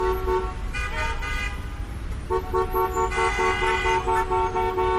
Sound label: motorcycle rev